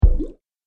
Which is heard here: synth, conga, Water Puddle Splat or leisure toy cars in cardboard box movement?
Water Puddle Splat